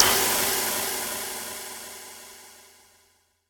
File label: Impacts